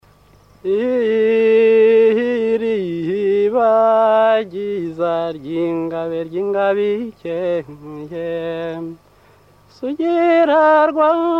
praise song, Burundi, Tutsi

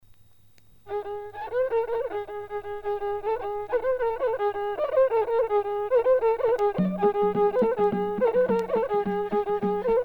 Endigiri, uganda

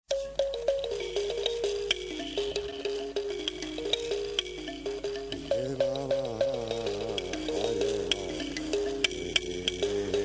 ikembe, rwanda

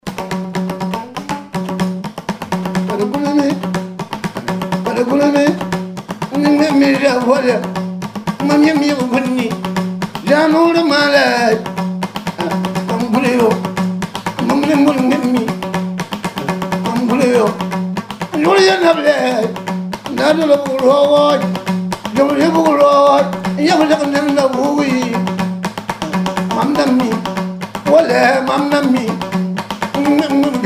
kon, ghana, frafra

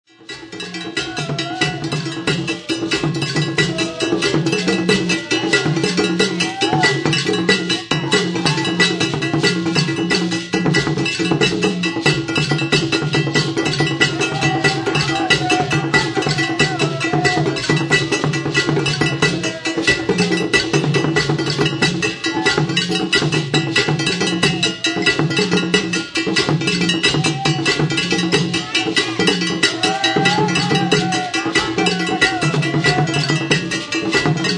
donno, ghana ashanti

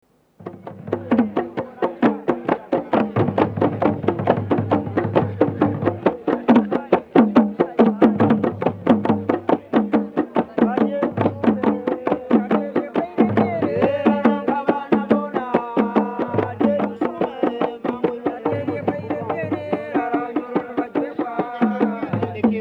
Entimbo, uganda

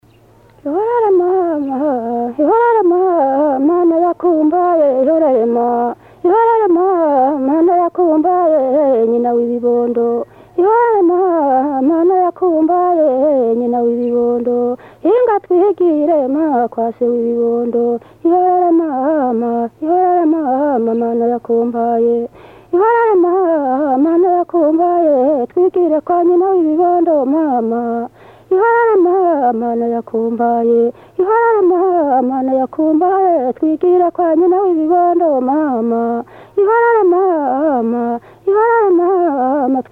igihozo, burundi

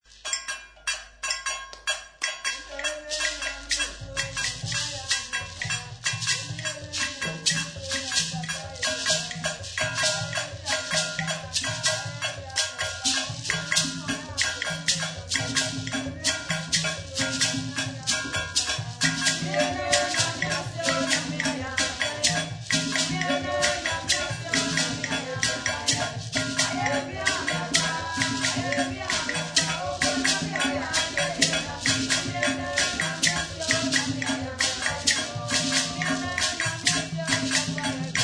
atoke kora, ashanti, ghana

